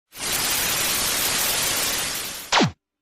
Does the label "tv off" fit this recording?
Yes